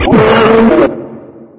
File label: dog alarmed, npc; Source Engine; video game